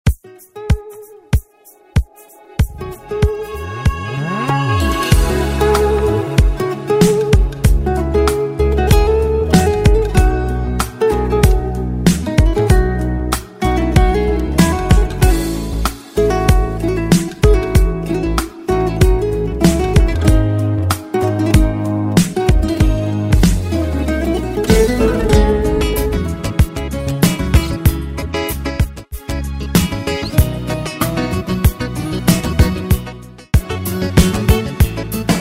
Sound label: Without words Guitar; آهنگ های بی کلام گیتار معروف پاپ